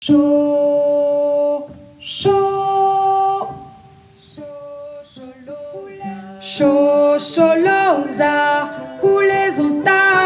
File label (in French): shosholoza voix séparées alto